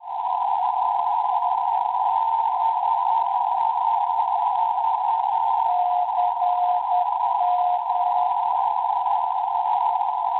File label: NDB 369 kHz; CM; Avignon; Francia; Schwarzsee